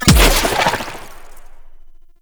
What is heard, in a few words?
Infested Arm Cannon Secondary Shot; Weapons